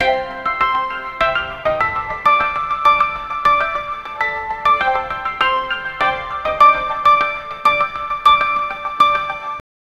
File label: sad song